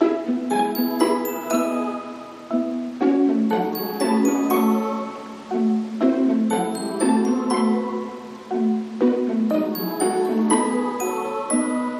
Success; animal jam classic ost linux macos windows gamerip 2010

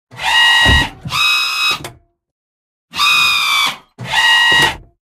Frost

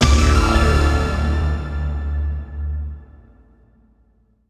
Wave End; Zombie

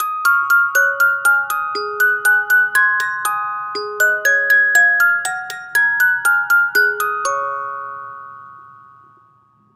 Music Box; Assassin's Creed Syndicate

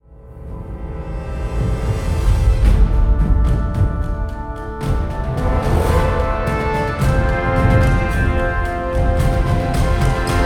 Fight Theme Frantic Fast Start

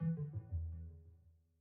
Loading (Jingle); Nintendo Switch Sports

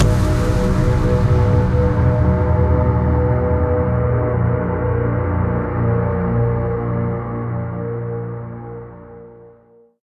Objective Failed (Inst); Killing Floor 2

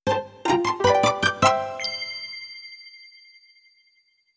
Before Start Jingle; Jogging +; Wii Fit Plus platinum soundtrack 2011

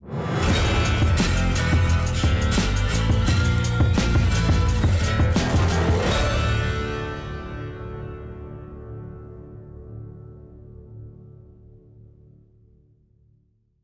Boss Win

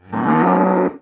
Monster Cow type Attack

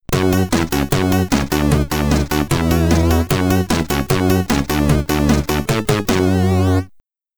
Final Results (Lost), SID Stereo; Warm Up; commodore 64

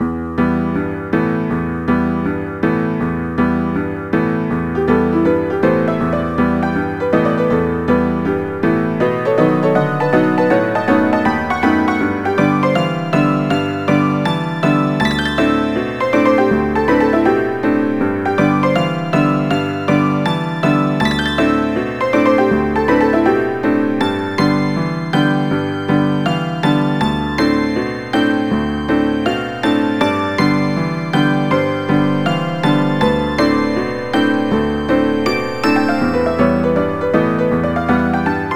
Dance Jive 2 Fast; Knigge Spielend zum guten Benehmen; NDS

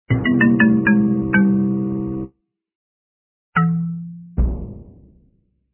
Game Over; Radical Rabbit Stew; PS4; Windows; Xbox One

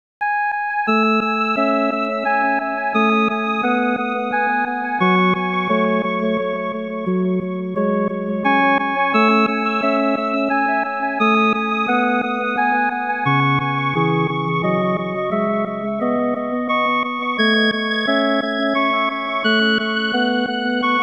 Death; nobunaga no yabou haouden; daikoukai jidai ii

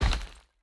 Moving Obstacle Stop Debris; Boomerang Fu

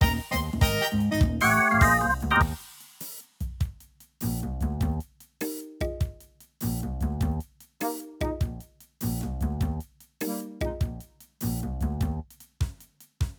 Dice BGM

